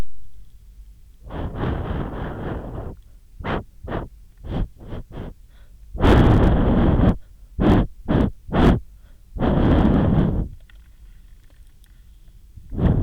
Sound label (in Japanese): バイノーラル 美耳 耳ふー; ASMR・お手軽〜高額バイノーラルマイクの差を調査隊!!